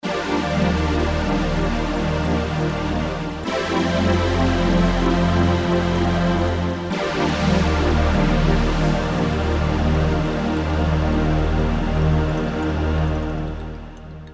synth; Guess the sampler game

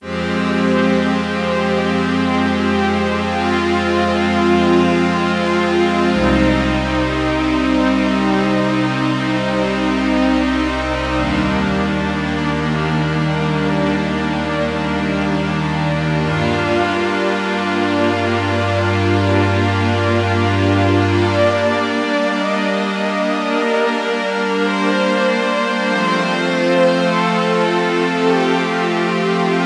Roland JX-1 SYN STRINGS

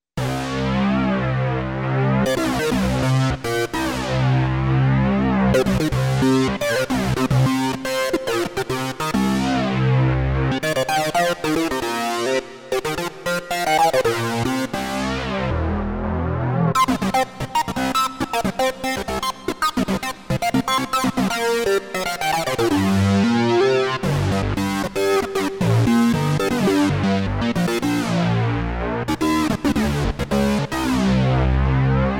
Synch'd, Oberheim OB-8

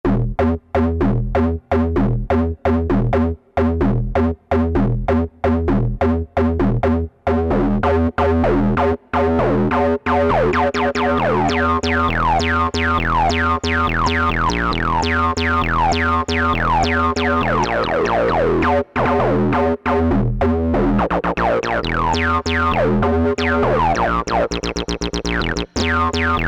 Rave Sq MW, Alesis Z2 EuroDance

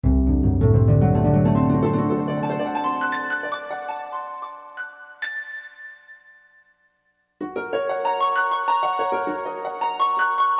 Harp; Orchestra 1

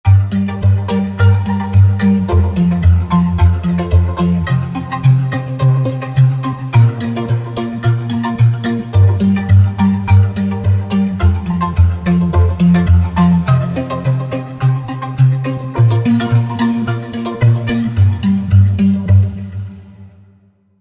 OTODAMA, Roland V-Synth

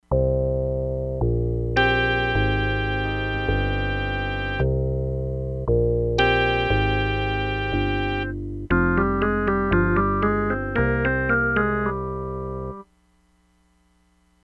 ORGAN BASS PEDAL SQUARE

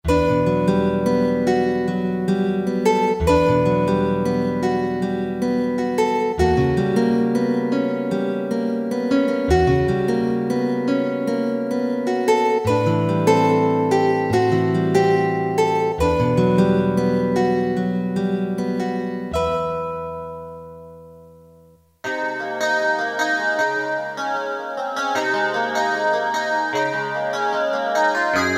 Guitar - Syn Pad, Roland EM-10

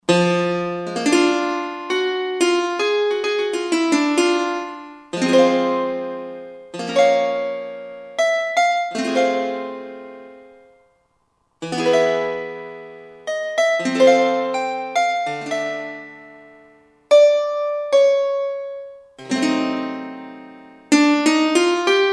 Clarsah Harp, Roland SR-JV80-16 Orchestral II

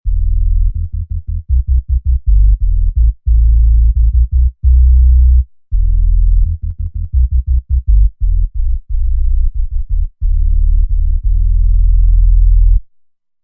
Sub Bass

